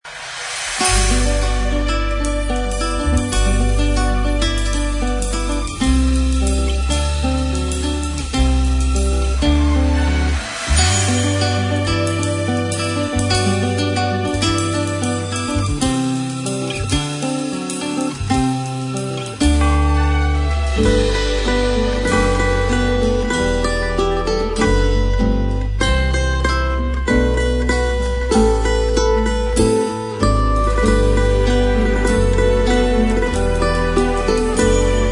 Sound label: Jingle Bells (Instrumental); Natal E Ano Novo